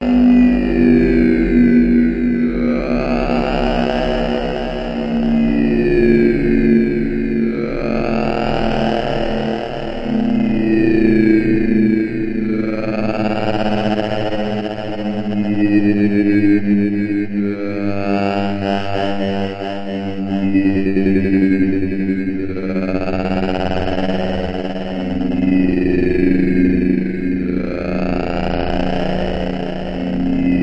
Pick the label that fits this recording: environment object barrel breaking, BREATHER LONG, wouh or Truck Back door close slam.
BREATHER LONG